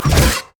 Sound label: nashor sweeping blow; monsters; LoL